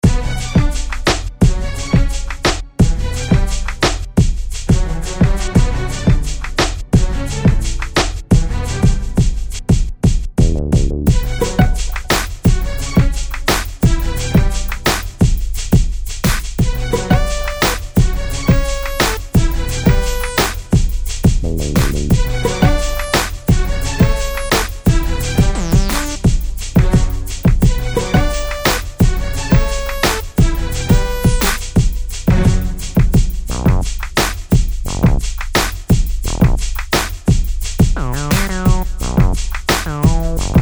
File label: Music background pads